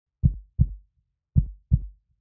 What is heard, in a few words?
heartbeat